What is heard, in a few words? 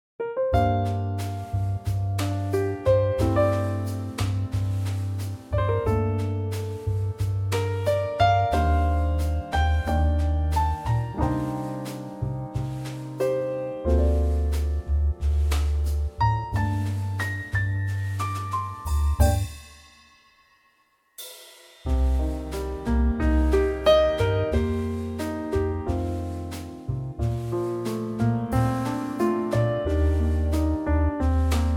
bossa Gm trio